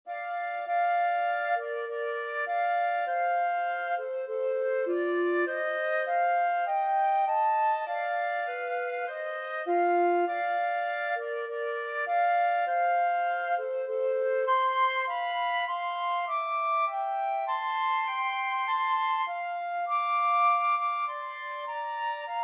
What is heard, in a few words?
beautiful for spacious flute clarinet, flute clarinet duet